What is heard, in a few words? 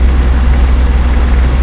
airflow; NPC; Mafie 1